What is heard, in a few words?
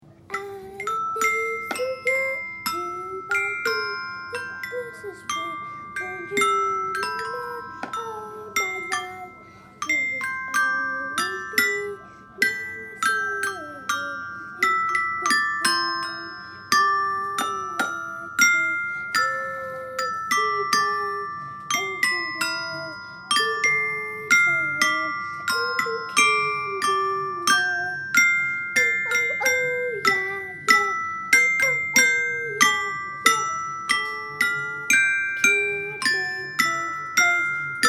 Bells